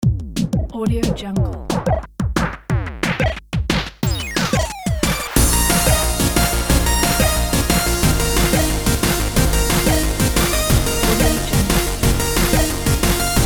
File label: 8bit chiptune